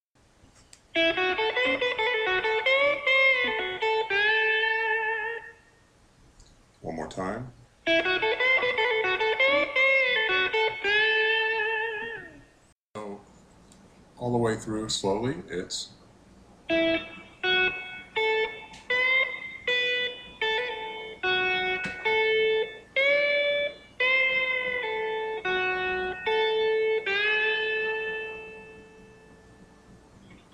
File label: style a blues lick